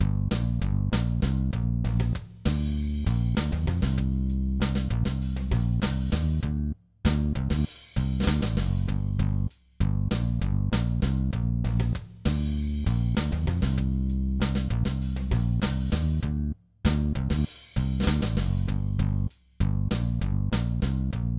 Stage Cleared Loop; Canvaleon; Wii U

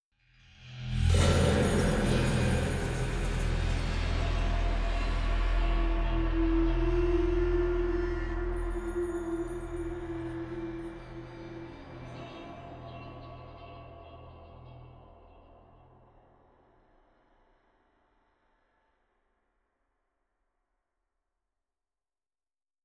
Player Death; Troy Musou; PS3; Greek

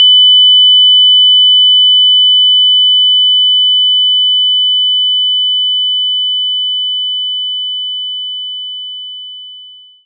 Triangle 3000Hz; knights try

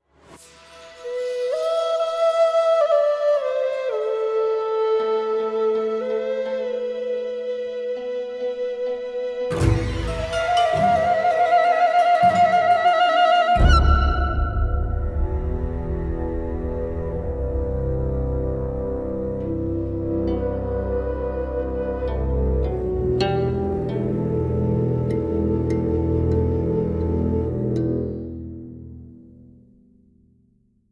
Music Stem

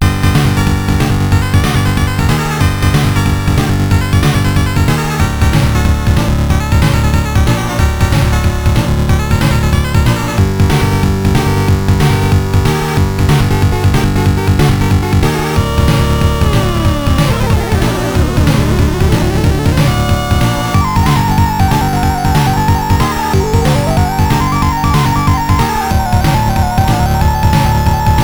Bonus Table; Devils Crush

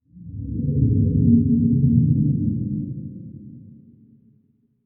magic organic flutter ethereal

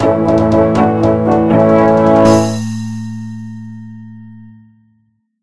level up; Mining

